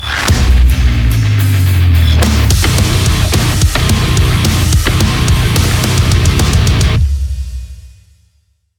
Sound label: heavy; Doom